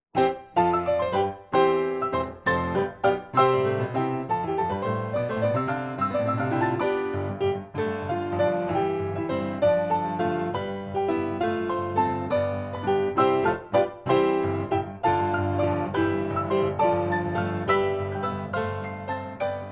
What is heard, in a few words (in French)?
Valse; Marie Jael